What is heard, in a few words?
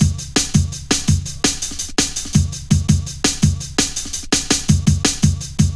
Fat Rolla, Jungle Drum Loops 166.5; Zero-G Jungle Warfare 1, 2 & 3